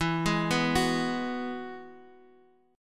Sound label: E Major Add 9th, Guitar, Open F; arpeggiated